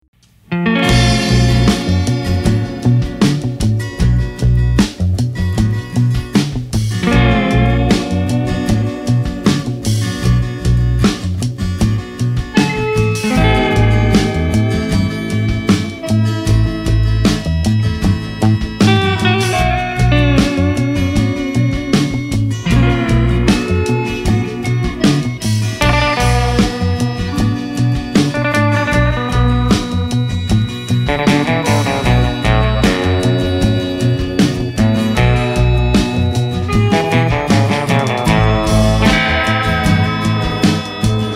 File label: western